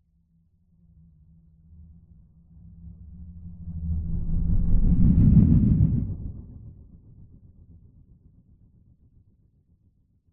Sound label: science fiction spacecraft fly by fast